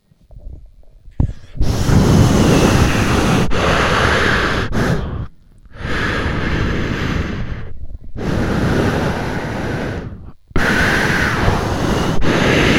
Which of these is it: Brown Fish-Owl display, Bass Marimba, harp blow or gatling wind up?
harp blow